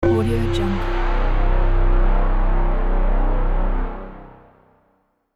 low eerie drone bell; sound-ideas

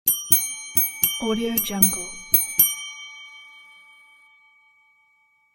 hotel reception bell ringing

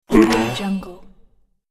fantasy card game ogre summoned; sonic-boom

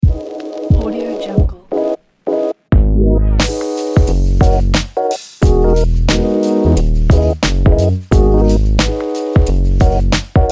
smooth hip hop promo

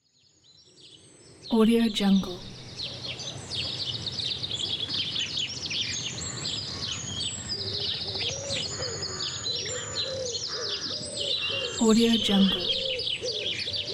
chirping birds

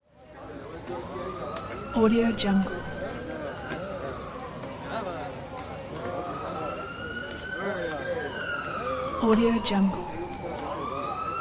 hospital ambience

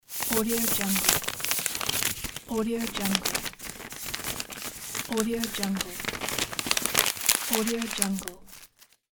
tearing paper

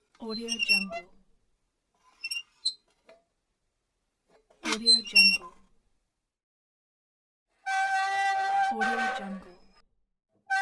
creaky doors gates

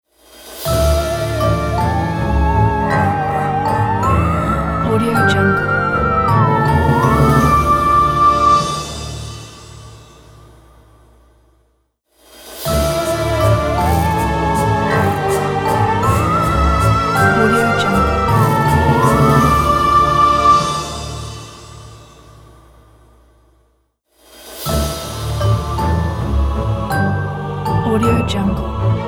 halloween ident

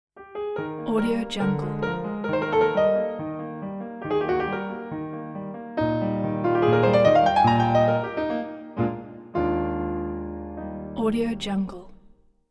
classical piano ident; mozart

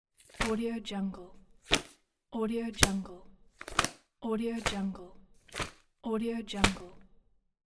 magazine closing

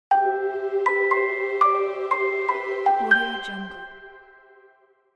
cute kids logo

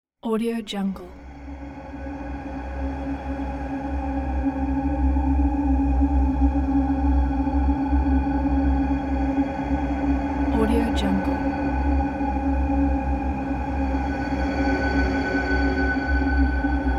singing bowl drone

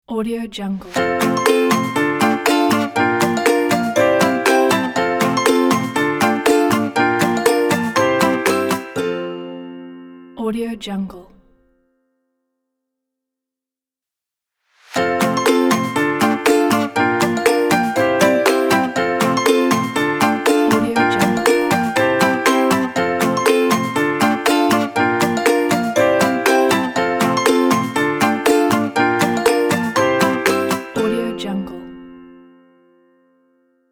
positive acoustic logo intro

